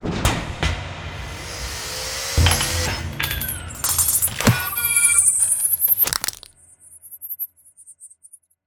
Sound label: (Instrumental)